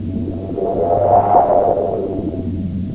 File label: lab moan; ambience